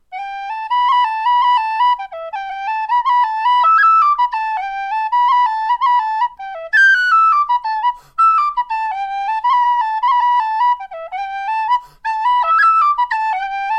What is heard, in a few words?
fast on whistle; Jigs